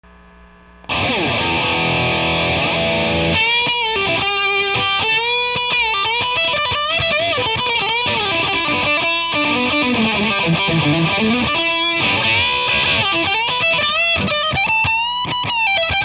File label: firebird